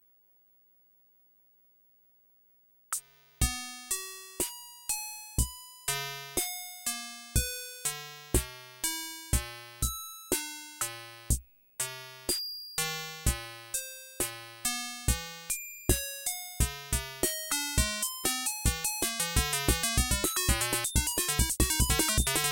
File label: dr-55 atari sync